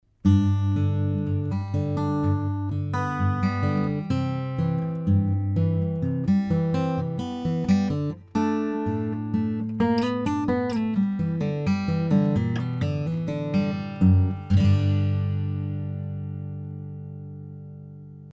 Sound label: Collings CJ35